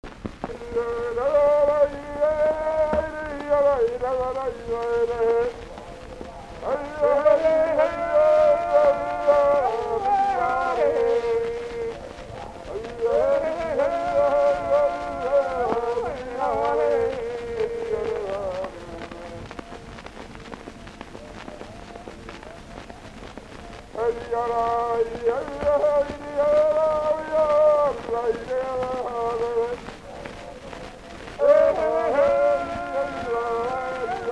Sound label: Tahitian field recordings, Chorus; Edison Blue Amberol; Himene Chorus; UCSB Cylinder Audio Archive